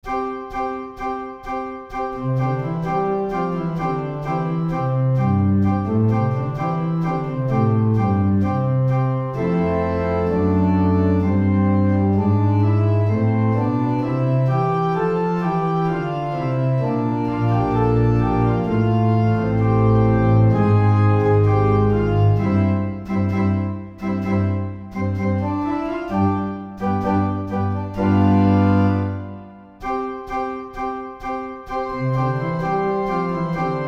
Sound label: Organ; Soundiron Alpha Organ